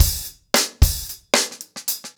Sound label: Hip Hop 110BPM, acoustic drums drumloops